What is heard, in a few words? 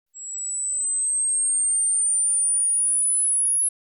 Camera flash charge